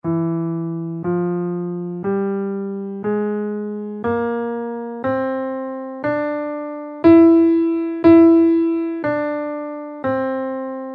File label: super locrian scale in Em, guitar